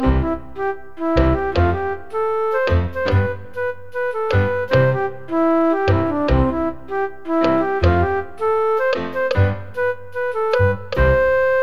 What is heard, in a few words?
Flute; Martin Music